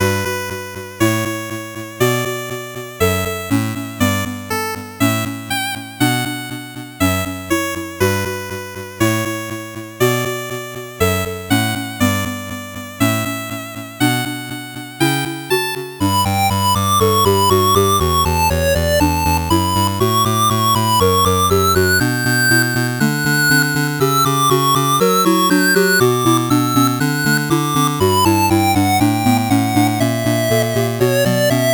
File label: 8bit bgm fast